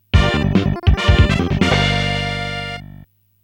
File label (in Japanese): ファンファーレ II